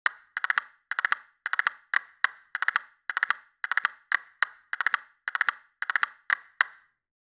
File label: castanets dry